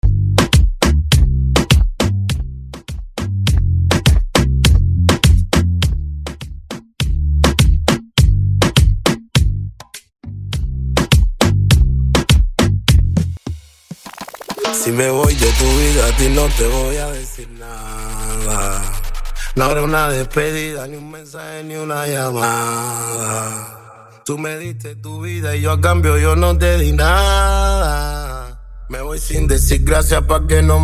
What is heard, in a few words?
intro acapella clean 102bpm